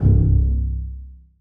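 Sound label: basses pizzicato c3; Sonatina Symphonic Orchestra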